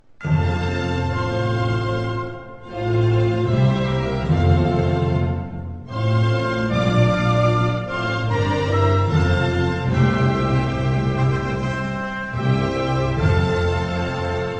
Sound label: anthem